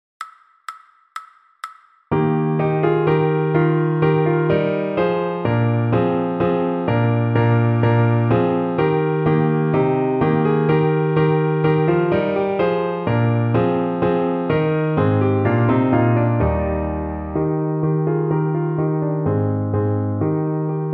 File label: piano fast; Den VI Ronde